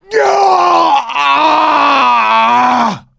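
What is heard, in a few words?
death scream, male; survivor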